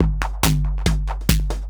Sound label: DRUMLOOP TEKNO 140; Techno2